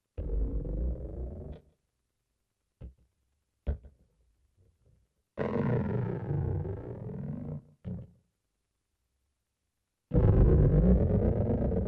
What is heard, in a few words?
micro macro, keyboards